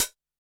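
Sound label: Electro Kit Closed Hat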